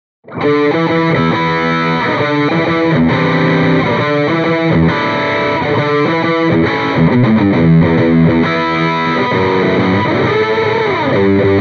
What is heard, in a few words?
Celestion G12T 75 Distortion Les Paul